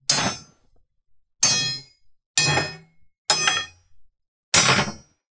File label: impact metal steel roof brace on wooden floor x5; zapsplat